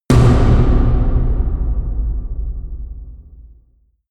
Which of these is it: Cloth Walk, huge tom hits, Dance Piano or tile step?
huge tom hits